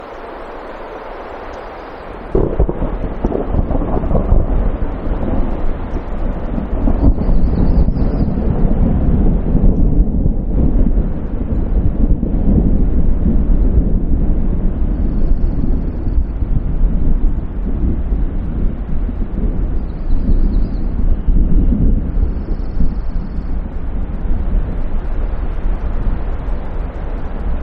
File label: Close Thunder Without Clipping; alaska acoustic highlights; GEOPHONY